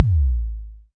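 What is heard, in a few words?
Pearl SC-40 Kicks, bass drum; drum machines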